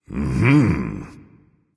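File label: nonverbal agree; smite; voicepack; ullr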